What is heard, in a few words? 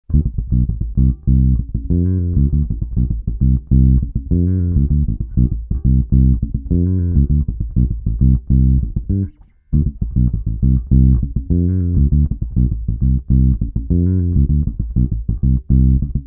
bassboost